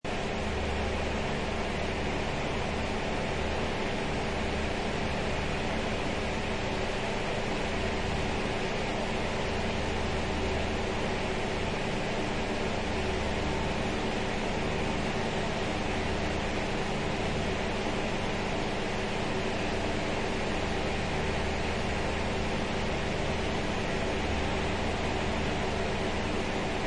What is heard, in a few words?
strong fan on low setting